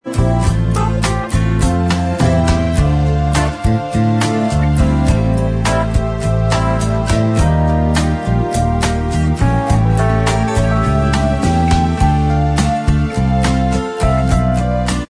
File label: bossa version Inst Track